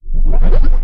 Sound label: Ability Charged; Kilta